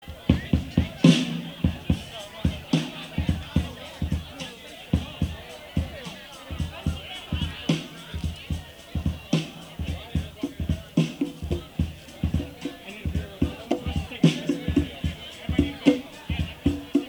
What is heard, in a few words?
Percusion